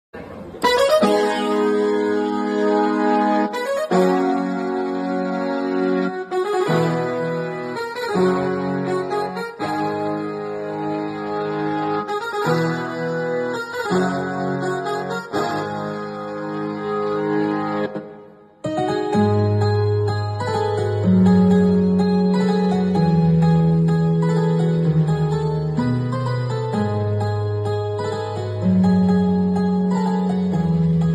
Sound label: bgm piano music; kgf; rocky bhai; kgf2; rocking star yash